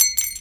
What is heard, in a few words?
physics glass shards default